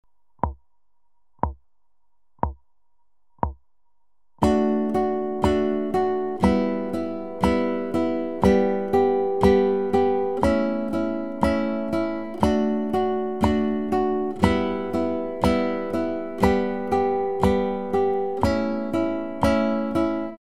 Strumming, Acoustic Pop Guitar; Strumming Nr. 1

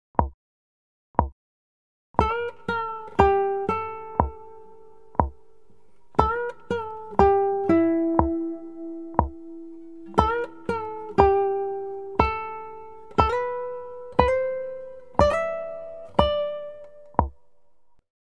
Intro, Acoustic Pop Guitar

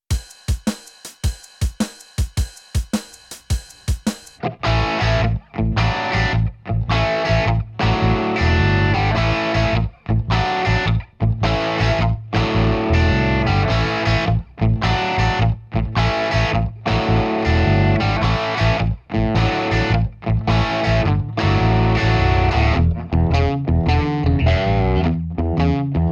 Heavy Shuffle; Bum Bum Tschack 1

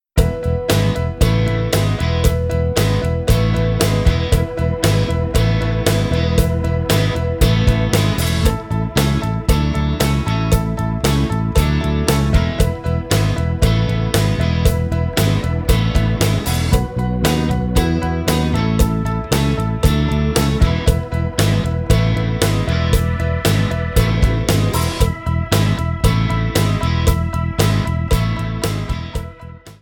Blues-Rock In E, Bass; Bass Unlimited

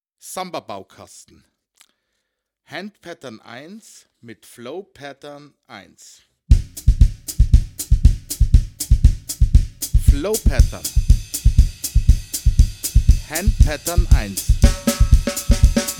Samba-Baukasten, Latin; Samba-Baukasten 2; Schwarzes Album; Bum Bum Tschack Jazz Latin

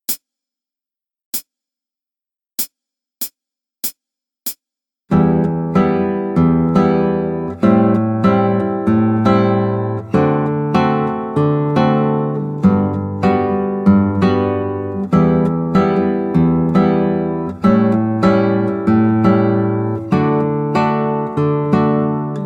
Begleitung, Gitarre; Play Guitar Gitarrenschule 2

